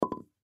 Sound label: Wood Object Down, Foley Wood Object Set Down Knick Knack Statue; Materials; Blastwave